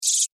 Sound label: Beetle Chirp, Beetle, Chirp, Vocalization, Insect, Bug